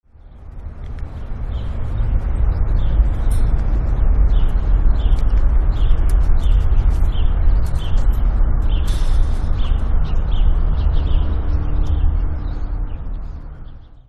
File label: birds